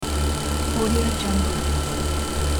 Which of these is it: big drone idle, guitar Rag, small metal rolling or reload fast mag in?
big drone idle